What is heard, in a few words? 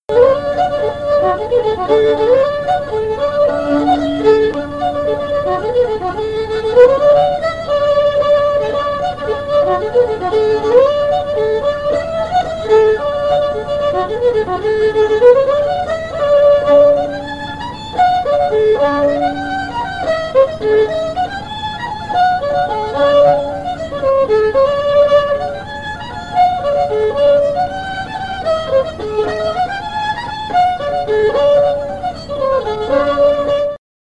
fiddle; birkhall